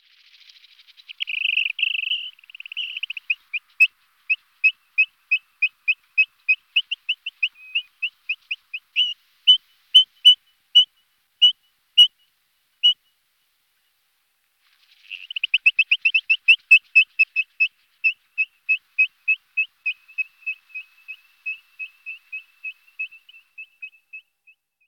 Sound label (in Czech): Kulik hnedy Charadrius morinellus